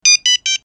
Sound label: Turn Off